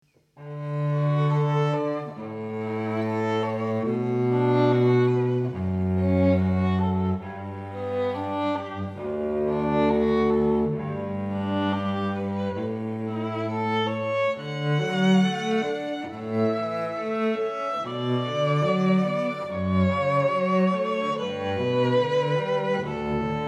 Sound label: canon; pachelbel